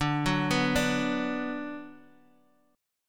D 6th D down, chord; D6 arpeggiated; JGuitar

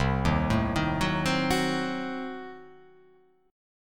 C Diminished 7th C

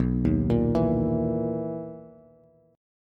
B Major 11th B down Bass; BM11; arpeggiated; JGuitar

